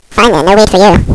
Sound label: pain; quake2; bong